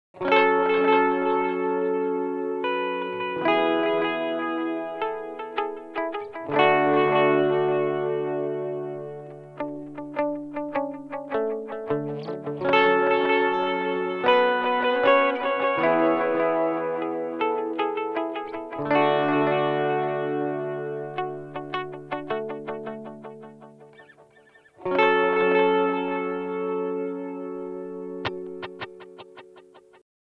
Tape; Source Audio Nemesis

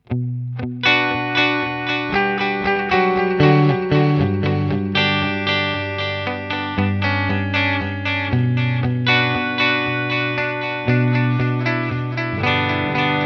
clean Delay Plate Reverb; Boss Katana Artist

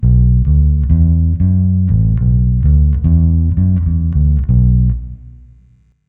Boss Katana Go Bass Clean

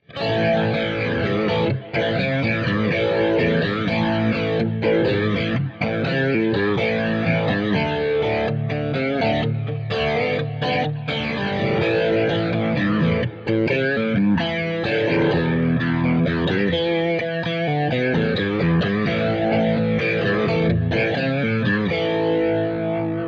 Slow Leslie; Scuffham Amps S-Gear

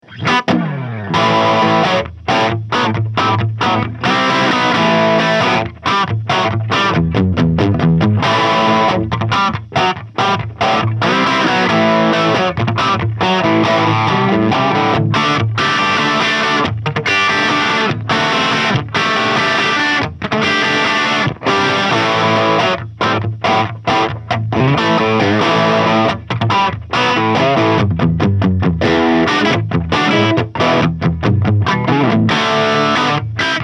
Electro Harmonix MIG 50 Humbucker Crunch

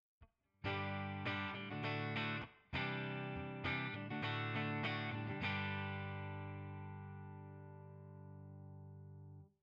Dumble Overdrive Special; ToneX Pedal

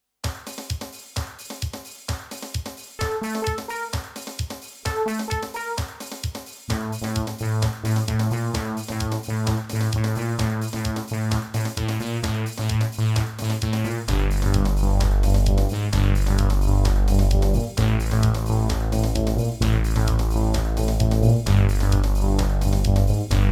Opus 3 Bass

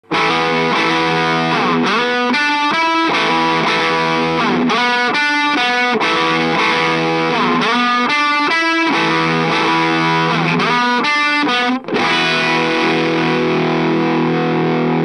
Octave Clang high Gain Tone auf; Death by Audio

